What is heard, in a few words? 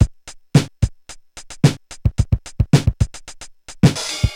Korg MR-16 (110BPM), beats; musicradar; cyborg beats